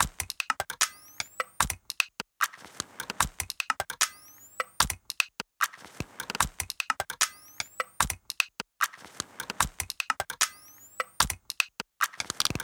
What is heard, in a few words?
Click Loop